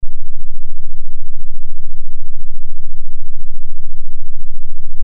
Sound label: bass test; sony